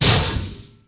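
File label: SMALL CRASH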